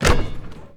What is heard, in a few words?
Basketball Dunk